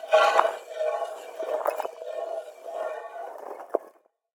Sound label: defeat spin; smite; kuzenbo